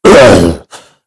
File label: pain, mummy